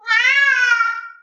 3 syllable rah ah ah 1411Hz; sylvester the cat

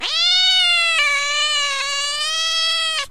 5 syllable talk shriek 2960Hz, sylvester the cat

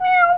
1 syllable mew 727Hz, cat; sylvester the cat

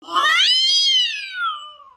6 syllable shriek yowl 3243Hz, cat; sylvester the cat

